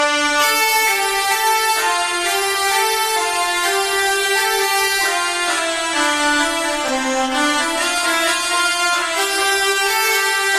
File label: instrumental ringtone